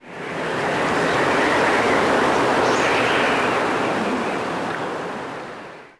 wind; DAoC

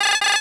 alarm

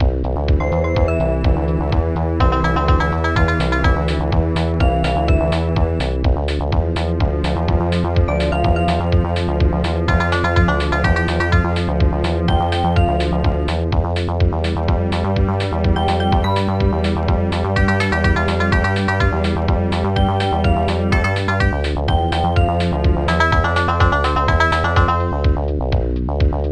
Space music; blockblank

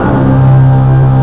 LW STRING; Groovy Bytes: Behind the Moon

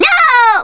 player die; napalm bomber 3D

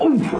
RUCK

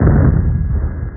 Small Explode; Realmz

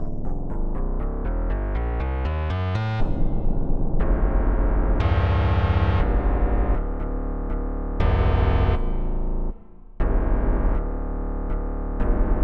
Dub Reggae Kit; Onj's Soundfont

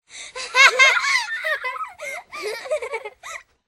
giggle; WMBonusXP

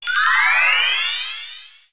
level up; Earth and Beyond; EA Games

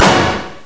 fortissi; battletrucks

